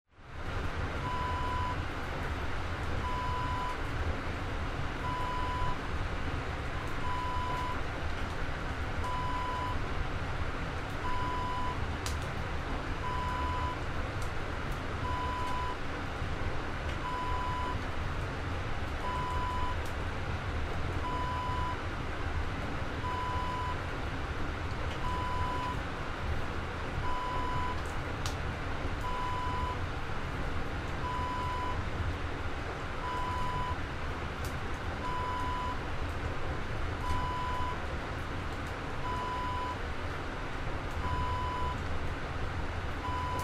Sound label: sauna wood burning ambience